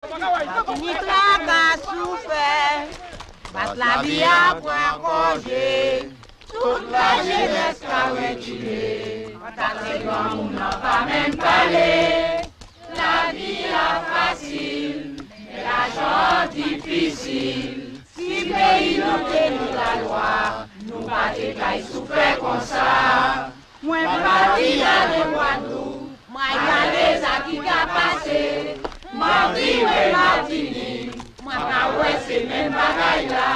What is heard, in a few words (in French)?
Chant ouvrier